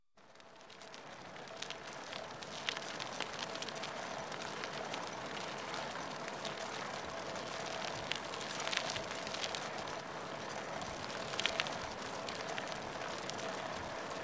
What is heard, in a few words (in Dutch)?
Fietsen; KMP Film Video Sound Effects